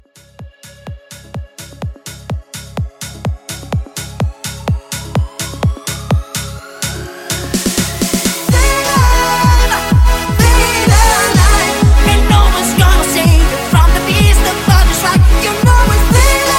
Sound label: Bass House Bootleg 126 CLEAN